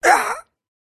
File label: mage student wound